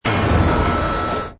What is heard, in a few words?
Hi Tech Panel Servo Button; High Tech Sound Effects